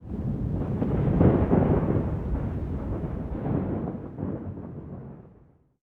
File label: Thunder